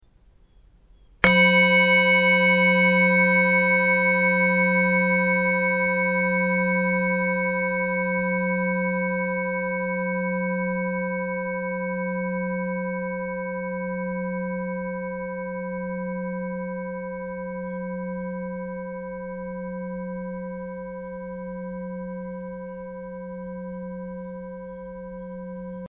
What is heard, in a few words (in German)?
Klangschale